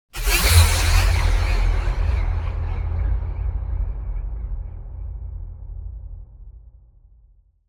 Whoosh Sharp Echo - Transition; Hollywood Edge; sound designer's toolkit v2

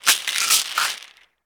Medicine Bottle Shake; Medical; Blastwave

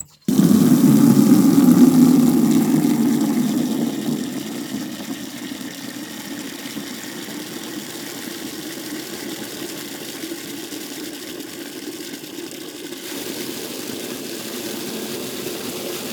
water filling; best service Blue Box; water fx

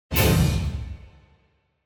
Symphonic Hit Short Final Hits - Light and Lower; Hollywood Edge; sound designer's toolkit v2

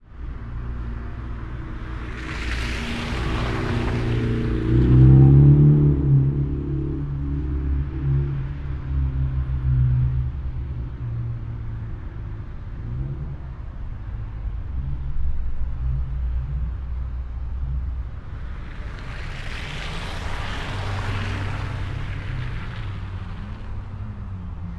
Suburban traffic car puddle; urban and suburban; boom library

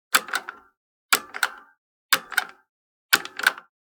Generic Button Metal Medium; boom library; The Interface

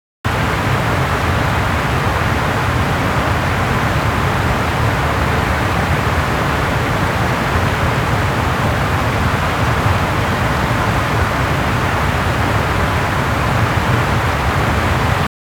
waterfall; best service Blue Box; water streams

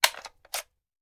Machine Gun Foley; Sound Ideas; Guns

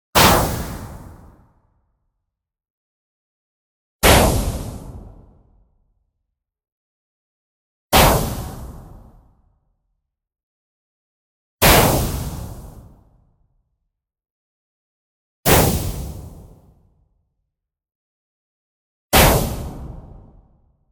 IMPACT WHITE NOISE Denoised Reverb Burst; boom library; Anime Essentials

